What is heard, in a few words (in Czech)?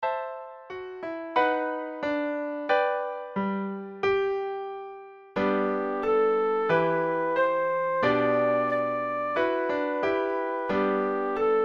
fletna klavir, zobcovou flétnu; zobcová flétna + klavír; Zasnění - M Krasev